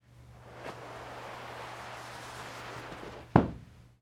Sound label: Carpet unroll